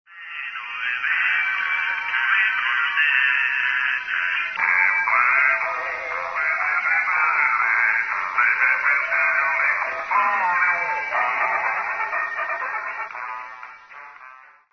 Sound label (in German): SSB Musik Versatz 100 400Hz